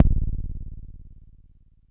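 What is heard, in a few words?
Long bass drum, Jomox Airbase99, Drum Machines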